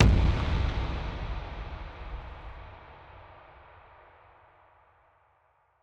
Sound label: Deep Cinematic Impact